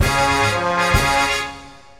win level; bobtherobber2

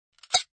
Key Fail; bobtherobber2

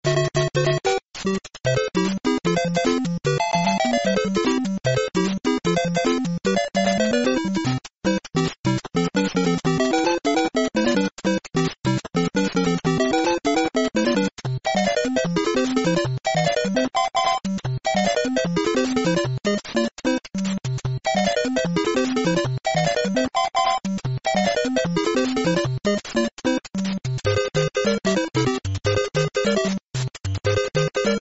Hurry Overworld; mario

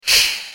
dilophosaurus hiss; crossyroad; dinosaurs

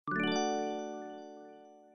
boo; factoryballsforever

